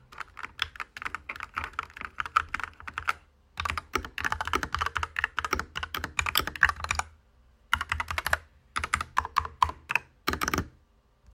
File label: Genesis Thor 404 TKL